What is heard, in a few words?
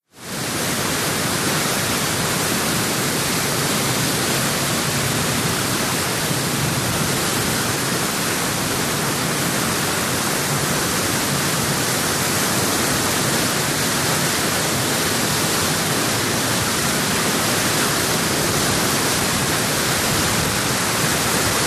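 BOATS & SHIPS OCEAN LINER: exterior: Stern wash, engine rumble.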